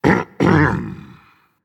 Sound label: Clear Throat Dragon; fafnir